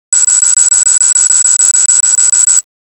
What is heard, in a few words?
Cart Key Unlock; Momentum-Firmware